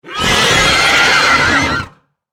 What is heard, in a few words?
Monster Demon Horses Scream, evil voice; Evil Voices; Horror; SFX Bible